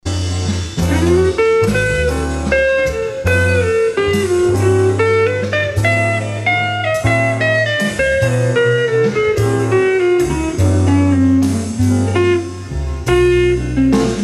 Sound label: SOLO FRAGMENT, guitar